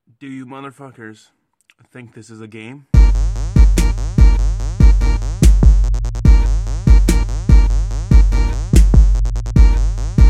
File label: beat